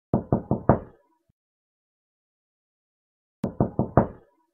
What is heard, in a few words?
knock knock door